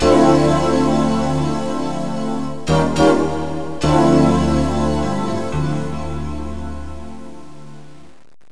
pad piano; Terra Sound Library; construction set

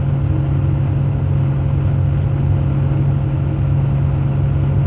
drive; Software of the Month Club - Business

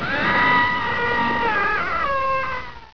MUNCH

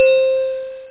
message

